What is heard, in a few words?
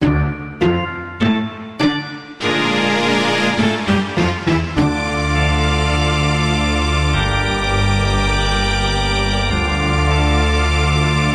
creepy castle